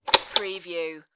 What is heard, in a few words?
plastic switch on off